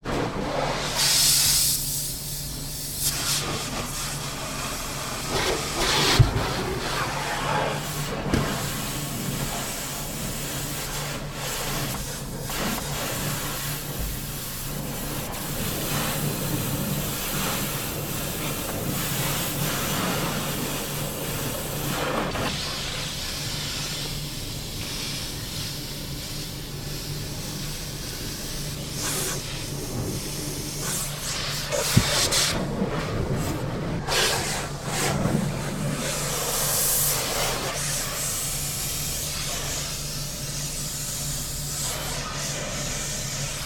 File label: industrial car wash jet spray pre wash internal car